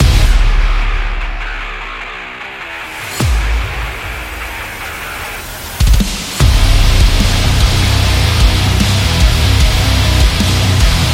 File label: Metal Loop